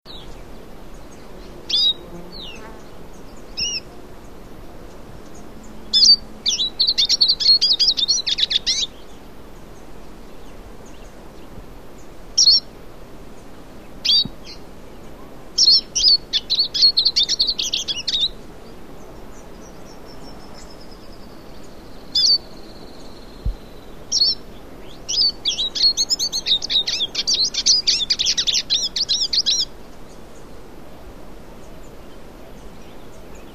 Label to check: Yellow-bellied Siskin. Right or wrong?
Right